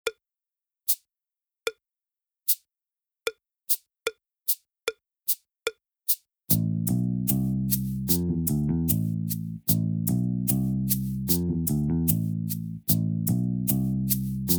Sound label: Bass Loop; Creative Drumming Made Simple